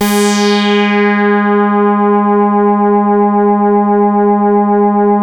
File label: MOOG G2; MINIMOOG; Greytsounds VOL-1